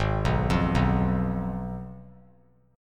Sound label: Ab Minor 6th Ab, down, 3C, 3F, 3A, 3D; Abm6 arpeggiated; JGuitar